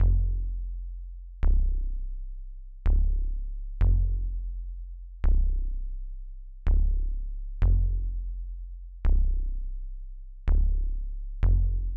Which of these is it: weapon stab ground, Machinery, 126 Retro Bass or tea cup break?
126 Retro Bass